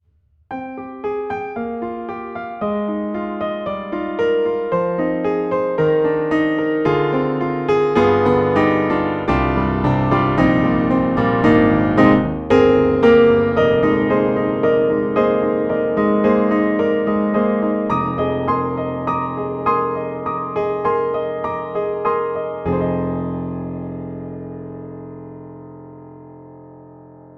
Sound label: Pianoteq D4 Classical AB, piano; MODARTT S.A.S.